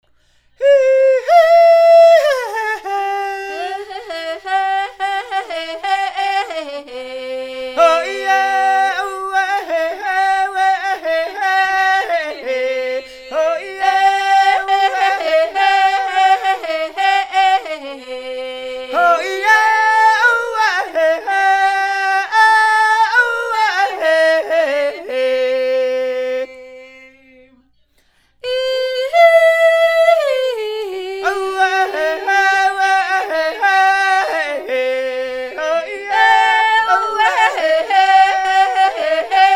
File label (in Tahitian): Himene tarava Raromatai; afaifai